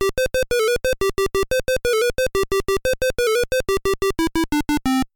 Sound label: Radar Alert; ringtones